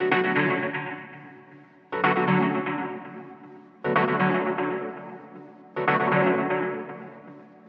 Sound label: Synth Loop 125 bpm Dmaj; Unit Rcrds